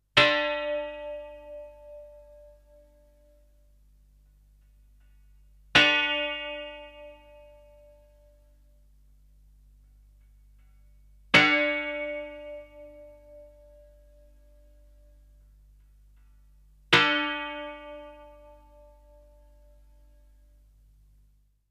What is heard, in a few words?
Plate gong